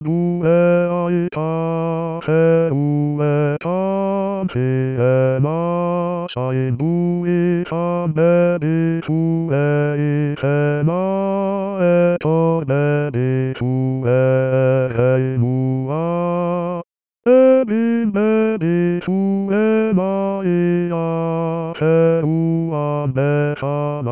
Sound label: Gur Ait Basse